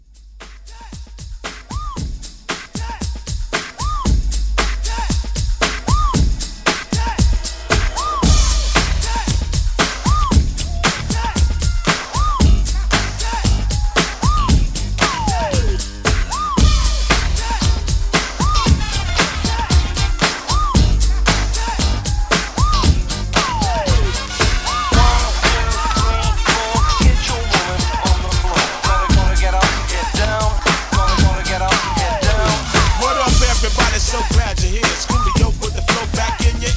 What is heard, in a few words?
90s Old School Re Drum 115